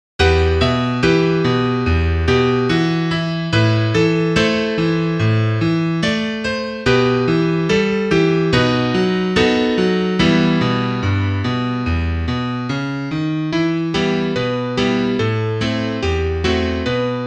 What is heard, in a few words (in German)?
Klavier Solo, trompete; Russisch